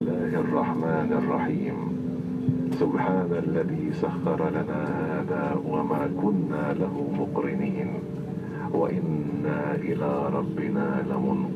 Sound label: Whirling Dervish Orchestra: Istanbul; This is music from the Whirling Dervish show